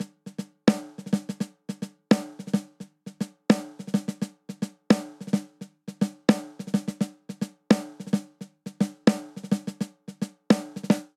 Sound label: Inbetween Hip Hop Snare Only 86 bpm; MIDI Clips; 4 4; Ableton; Drum Booth